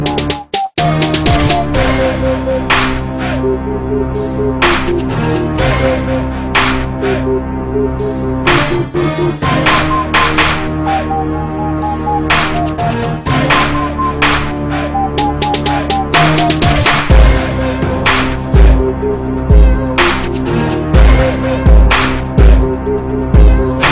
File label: black beat